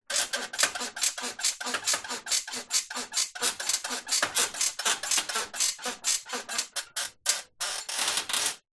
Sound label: household bed bunk metal framed movement creak loose fast sex room reverb